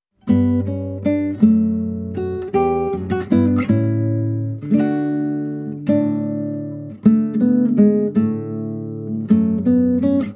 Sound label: Underwater Background Music, guitar; New Super Mario Bros. Wii; super mario series for guitar 2010